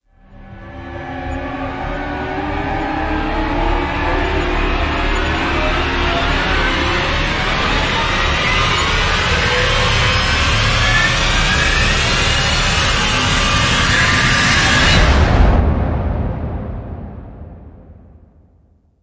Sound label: Rise